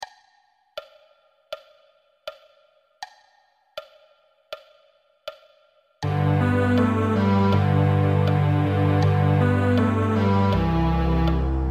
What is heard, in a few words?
Jingle bells cello duo easy playalong